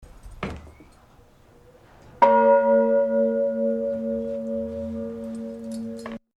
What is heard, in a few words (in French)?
cloche; Coustouges, cloche 2